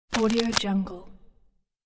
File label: rotten tree branch crack; nature sounds; خرد شدن شاخه پوسیده